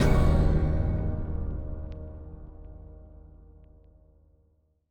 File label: Pipes Death; Very Little Nightmares